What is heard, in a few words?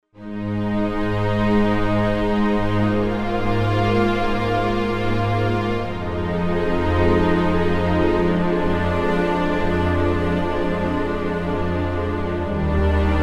Swell Strings